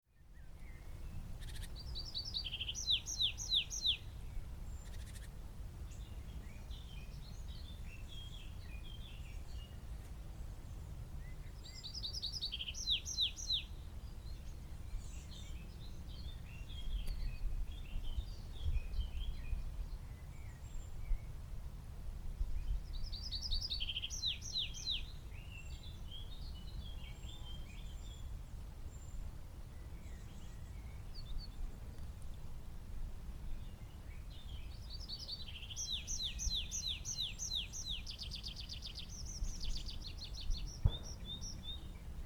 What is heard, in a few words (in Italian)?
Prispolone